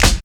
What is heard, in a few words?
combi drums